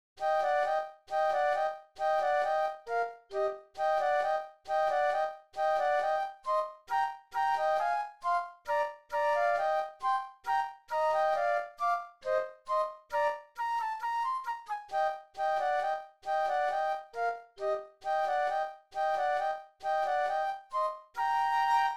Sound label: Radetzky March Flute Duet; patriotic; patriotic duets